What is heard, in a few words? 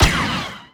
blaster; fleet trooper; bf2